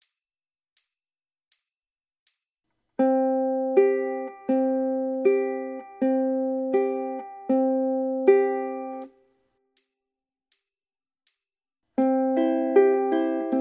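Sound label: alternate thumb picking, ukulele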